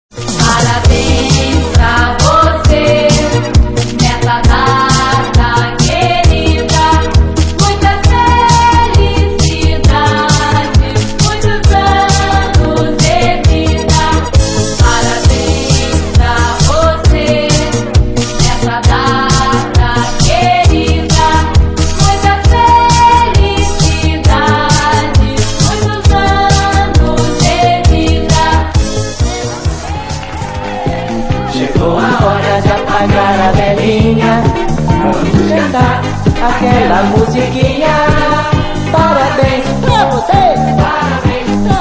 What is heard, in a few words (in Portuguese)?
Vinheta Parabens Pra Voce Remix; Brega; Zuacao